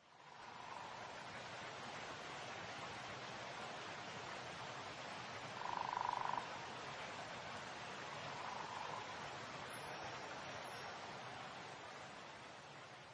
Melody

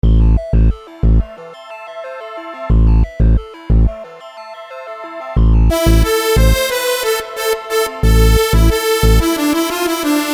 Sad Accordion

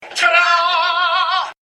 Rock

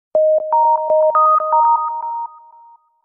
simple message alert; ringtones; sms

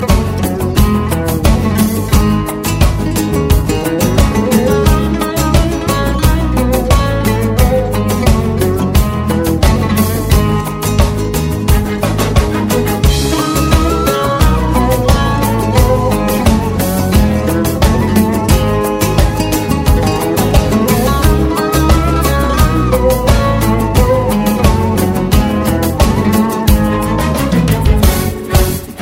turkish

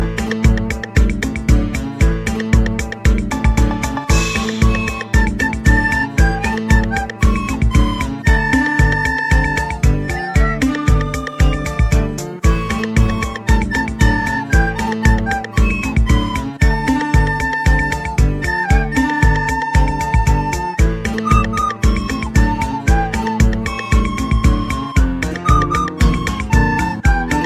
flute soul

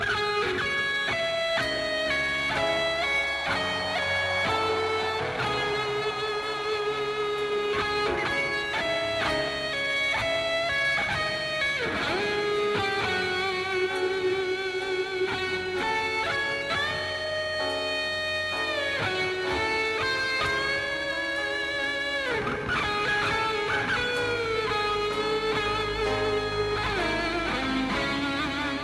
guitar solo, rock; ringtones

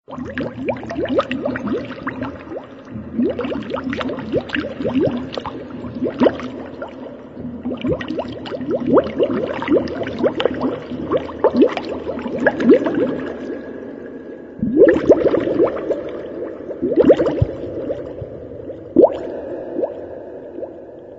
water bubbles; ringtones; sms

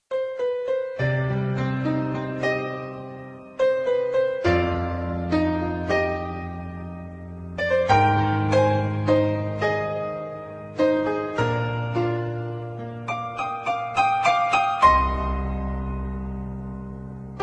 karaoke

